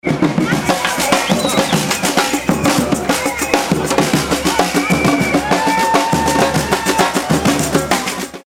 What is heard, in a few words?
street festival with samba; music elements; grooves